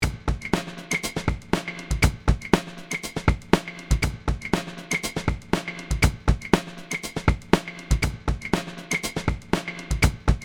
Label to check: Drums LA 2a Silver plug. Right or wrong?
Right